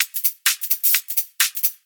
Hihat Loop 128 BPM

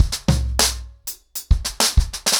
100BPM, ACOUSTIC DRUMS

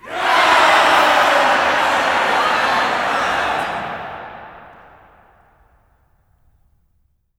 SHOUT CHOIR; LAUGH

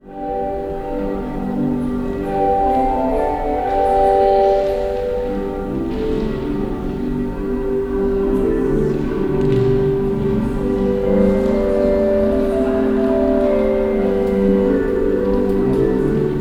IN CHURCH, Cathedral Organ; ILIO; Propeller Islands

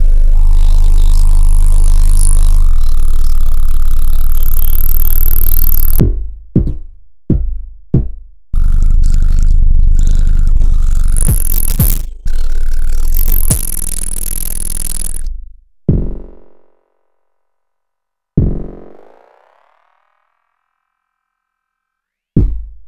Bass Experiment

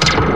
BLAST; East West; Global Sounds

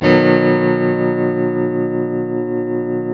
TELE CHORDS MAJ C, Guitars; Spectrasonics; Hans Zimmer Guitars VOL-1

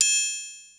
Triangle; Ensoniq ASR-X Pro

